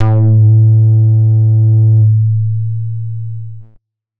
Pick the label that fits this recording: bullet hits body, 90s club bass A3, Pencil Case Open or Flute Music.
90s club bass A3